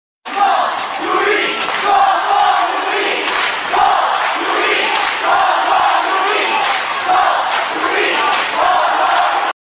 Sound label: Basketball